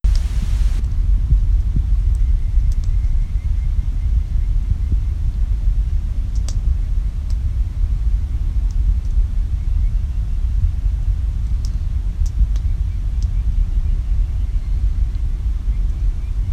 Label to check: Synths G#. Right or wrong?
Wrong